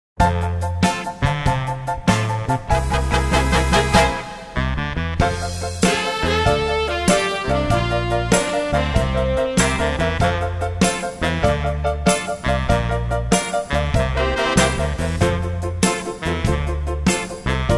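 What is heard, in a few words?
deck the halls accompaniment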